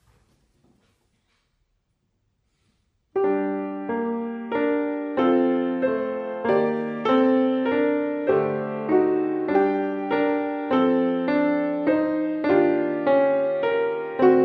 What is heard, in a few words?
piano duet